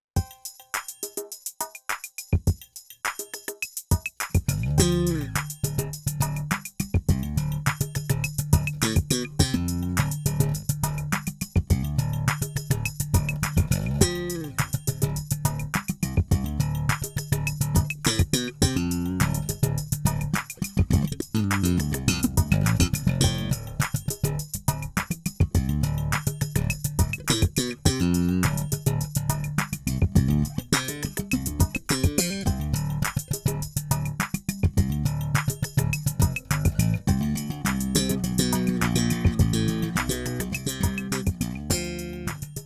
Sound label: MTD Funk in D